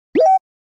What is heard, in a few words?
confirm; animal crossing